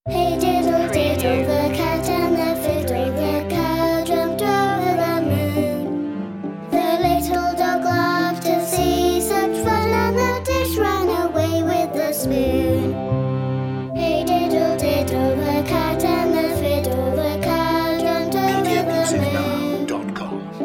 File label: children vocals; hey diddle diddle